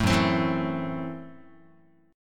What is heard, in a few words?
Gb Minor 7th Flat 5th Gb Guitar; strummed; 0,2,3,2,1,x; 4A,4E,5A,5D,5G#,6C#; JGuitar